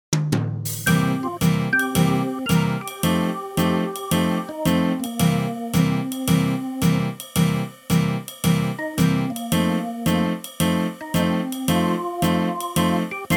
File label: MIDI blues; spellman studio